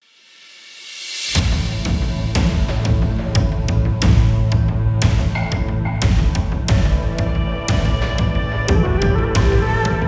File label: Automotive Cinematic Opener